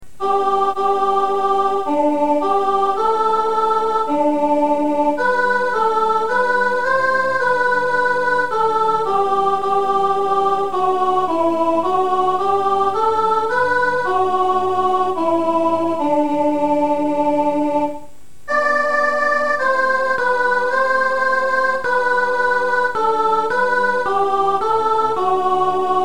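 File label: o come soprano, carol tracks; Christmas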